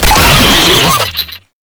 Proto Infested Brachiolyst Player Spotted, Vocals, Enemies; Warframe